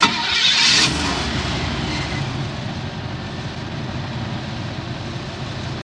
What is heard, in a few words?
Cadillac Start Into Idle Loop